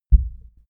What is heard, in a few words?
heart beat single low